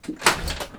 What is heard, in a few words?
DOOR latch; doorSounds